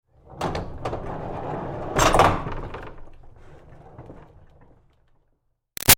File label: Door Barn Rolling Metal Clang Big Rattle; Barn Doors